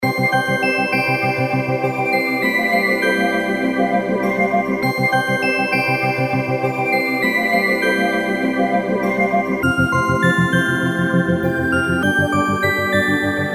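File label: background music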